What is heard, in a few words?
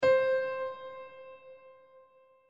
Dynamics ffff